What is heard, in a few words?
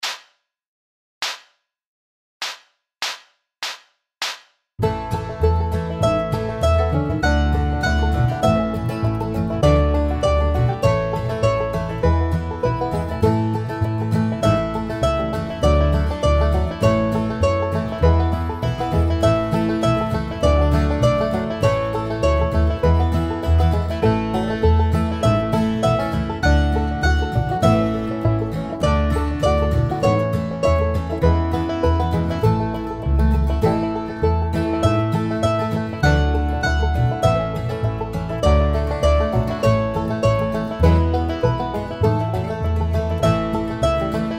Mandolin